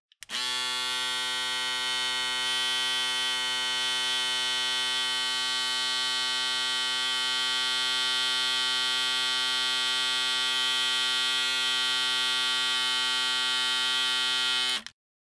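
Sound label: sound of razor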